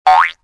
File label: cartoon